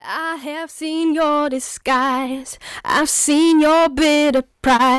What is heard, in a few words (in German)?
bpb saturator tape effekt; Vocal; Free Vocal Plugins